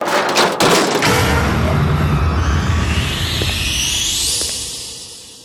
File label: tractor zoom; Square